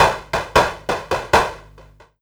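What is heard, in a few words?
SCUM snare drum, drum loop; Big Fish Audio; Uncivilised G'rooves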